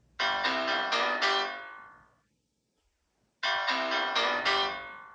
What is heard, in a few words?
piano riff, iphone